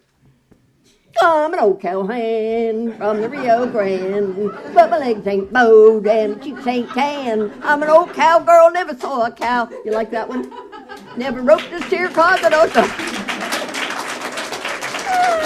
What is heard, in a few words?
Singing